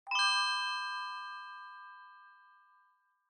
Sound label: Bell Accent, Low Dry